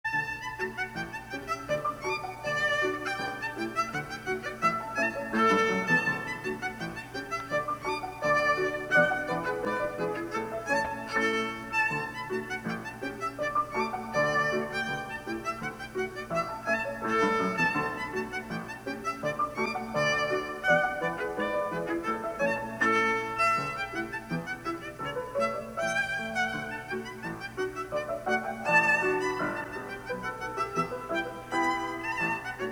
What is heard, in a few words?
gavotte